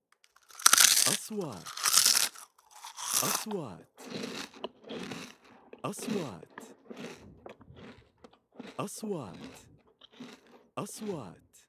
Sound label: The sound of eating potatoes chips crunchy; kitchen